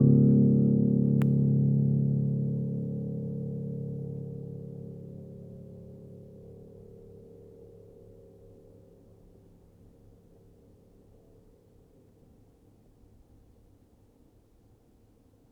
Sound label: Player, Upright Piano